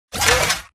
Mvmt Servo Walk; AI Stalker; Titanfall 2

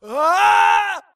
grunt death crush; Titanfall 2

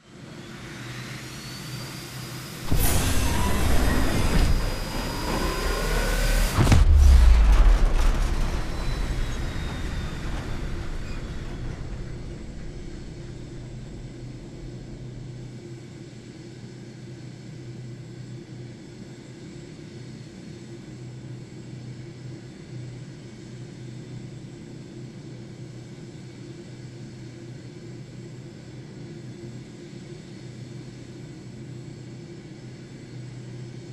evac warp fly out; crow; Titanfall 2

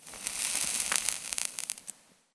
imp bullet energy default sizzle; Titanfall 2

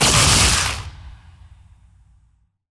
bullet energy titan arc; Titanfall 2

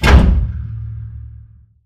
pod door ext verb close stop; Titanfall 2

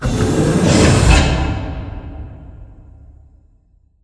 cooling panel rise; Titanfall 2

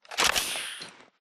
sniper reload mag out; krabersniper; Titanfall 2

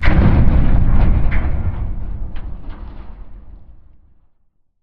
dish crane move stop metal stress; Titanfall 2

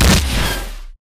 atlas eject propulsion; Titanfall 2

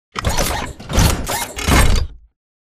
dash left; Titanfall 2

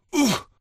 grunt punch kick; imc; Titanfall 2

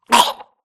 grunt death neck; Titanfall 2

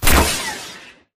atlas eject pilot launch; Titanfall 2

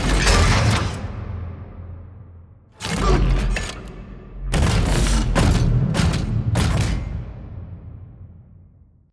control room pipe reverb; bt; beacon; Titanfall 2

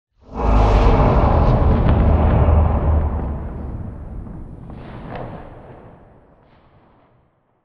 Explosion Rumble Exterior Close Wash Tail; Boomtown; Titanfall 2

